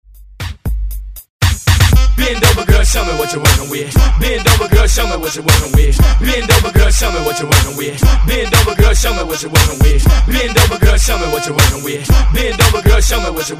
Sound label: Top40 Redrum